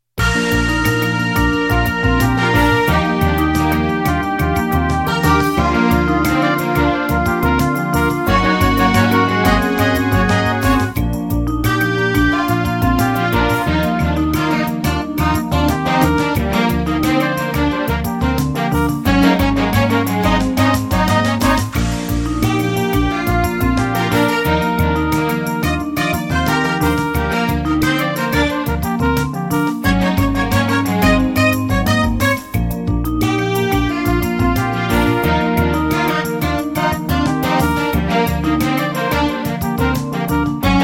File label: Fanfare